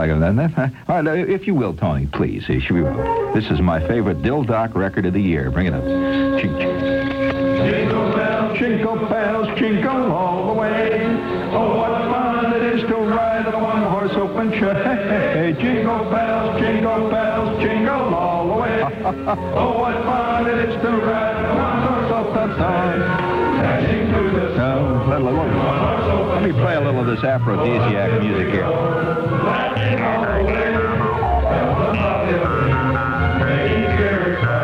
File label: Jingle Bells